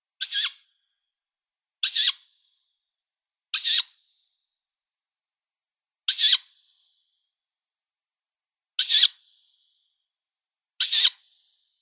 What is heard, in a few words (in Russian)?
neyasit seraya strix aluco sletki